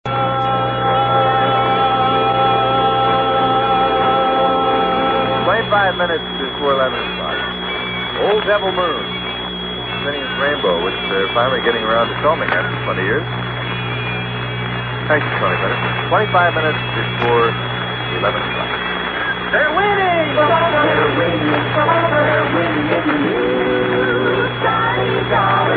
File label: vocal jingle; WNEW 1130